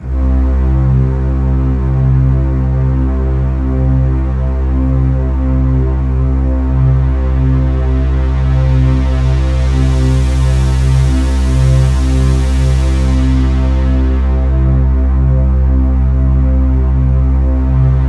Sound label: C2, Synth Line; 90 s Sample CDs; NiceBeats; SynthLine VOL-15